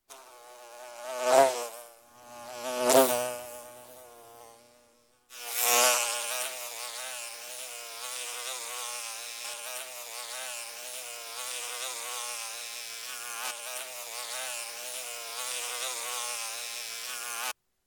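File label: horsefly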